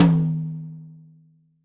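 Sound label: New Jazz Tom High, Roland BK-5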